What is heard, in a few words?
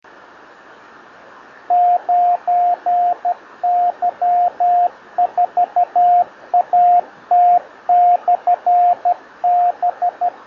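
50.015 MHz CW, ham radio beacon; 30 June 2007 - 9Y4AT/B in Trinidad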